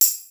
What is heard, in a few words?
DR-660 DNC, DRUMS PERC; Rubber Chicken Software Sample Bank